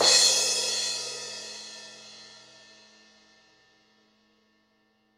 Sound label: cymbal; prefadoros FC